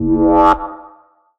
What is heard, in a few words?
Synth Shot F